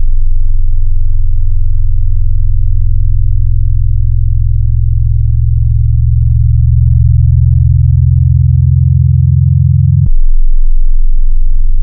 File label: FM, Wavetables